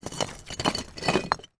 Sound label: foley broken bricks movement